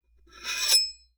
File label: Metal; TOOLKIT vol.2